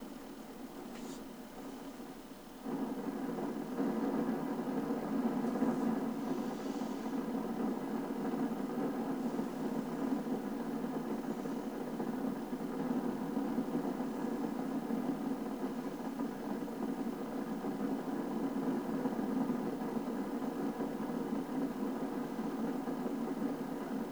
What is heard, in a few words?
Ambience; Ambience for April